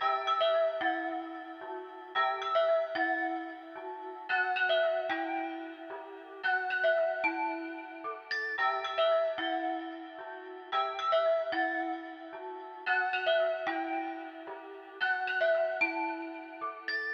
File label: Dark Melody Loop - 112 BPM E Min Bells; Melodies; Loop Stems; Cymatics; Oracle Sample Pack